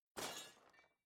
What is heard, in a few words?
break